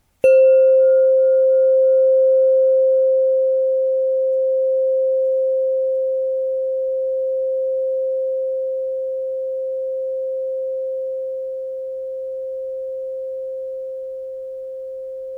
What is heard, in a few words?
528Hz Pipe, Tuned Pipes; sacrednotes